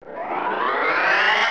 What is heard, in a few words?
THROW POWER UP; worms